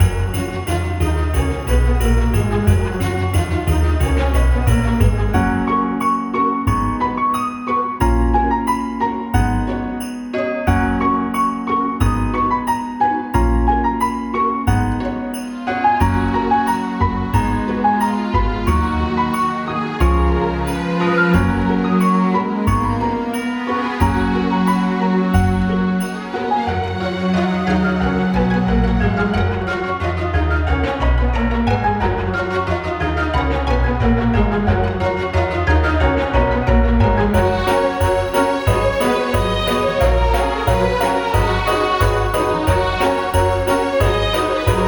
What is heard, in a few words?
room port christmas loop; Spacebase Startopia